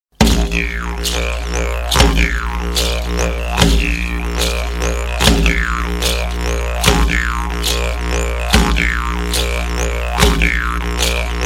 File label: vargan